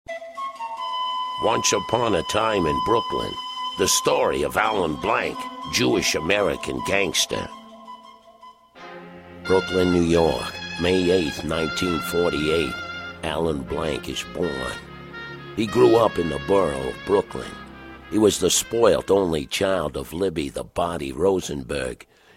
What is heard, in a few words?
corporate amusing